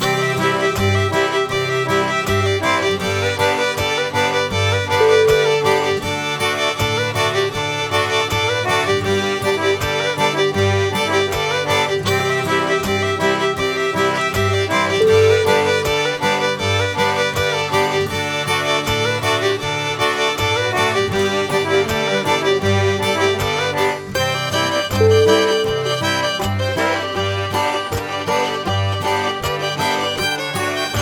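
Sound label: carefree cajun, music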